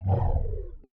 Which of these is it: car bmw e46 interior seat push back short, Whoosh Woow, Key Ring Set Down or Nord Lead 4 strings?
Whoosh Woow